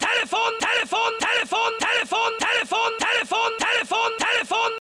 telefon telefon; ringtone

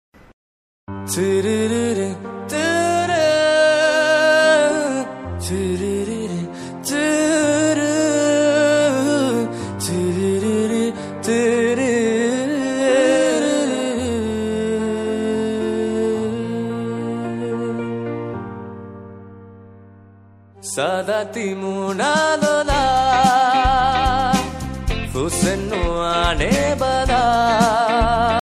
dhivehi song ringtone

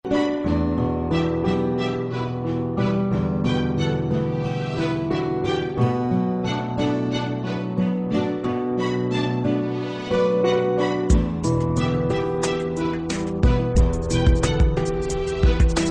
twilight romantic

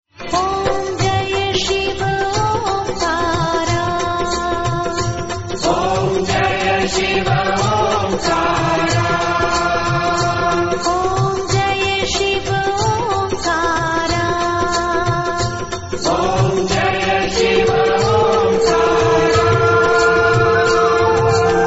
new shiv dhun ringtone

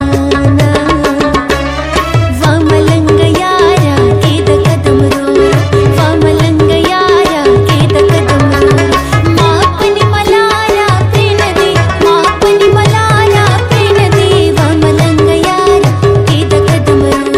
pashto ringtone

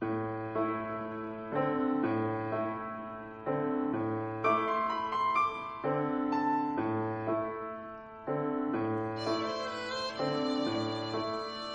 folk dance

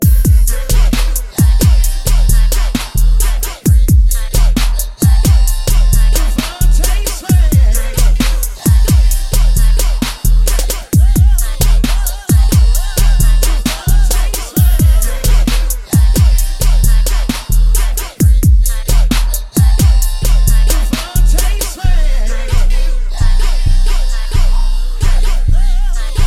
beat ringtone

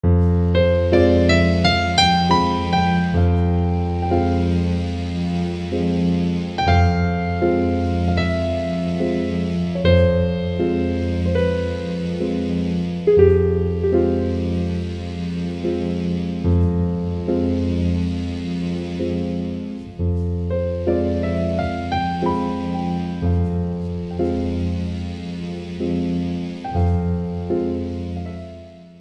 ringtone; satie

